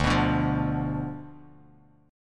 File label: D Minor 6th Bass Standard; Dm6 strummed; JGuitar